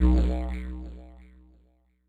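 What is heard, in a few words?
Didgeridoo; Yamaha DTXpress-II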